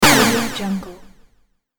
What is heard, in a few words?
laser echo